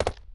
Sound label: jump, footsteps hard surface; grunt footsteps